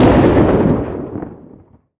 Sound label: EXPLOSION Distorted Long stereo